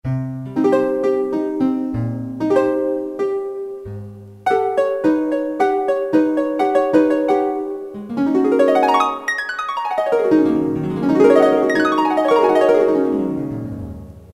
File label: Harp, Roland MT-32